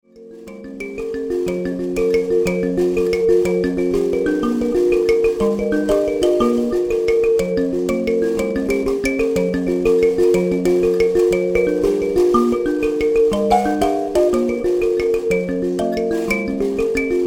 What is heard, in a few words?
mbira